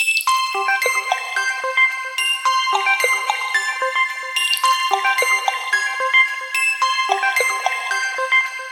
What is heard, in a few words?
Salt water; Lenovo A316i